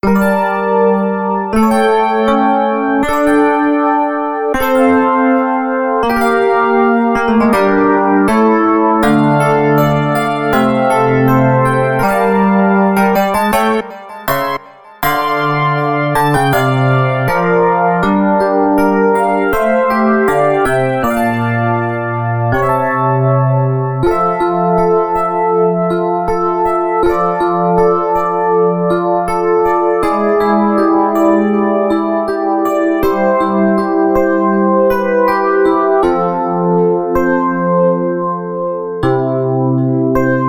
Electric piano There is a sorrow that seems to suit the rain Music